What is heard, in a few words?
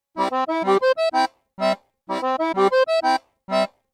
b pure major